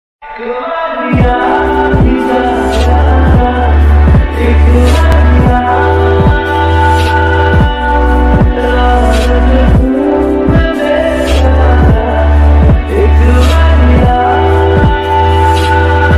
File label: ringtone lofi ringtone romantic ringtone